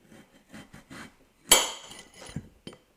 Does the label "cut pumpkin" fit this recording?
Yes